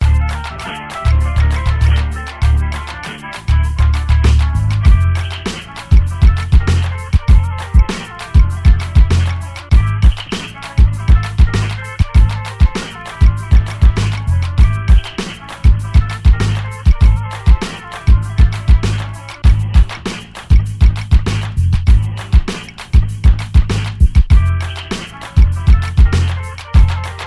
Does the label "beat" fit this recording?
Yes